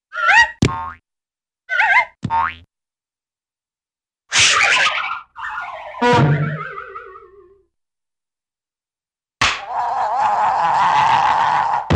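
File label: Whistley Whirl With jews Harp Boing Snap Boink, Cartoon; Hollywood Edge; Cartoon Trax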